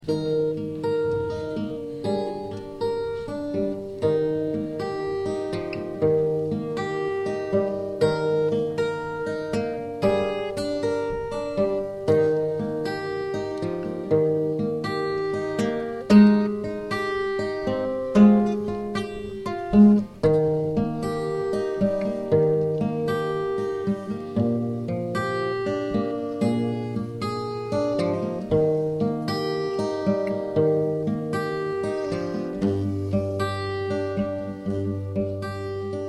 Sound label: played an instrumental; ashington folk club